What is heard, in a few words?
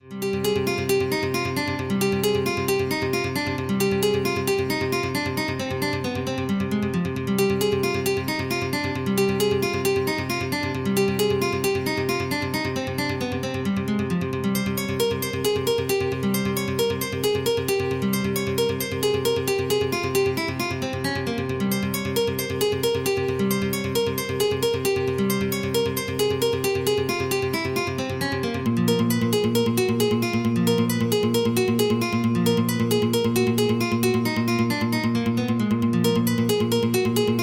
Instrumental